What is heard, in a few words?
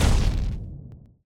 explosion Artillery Shock; explosions; Mindustry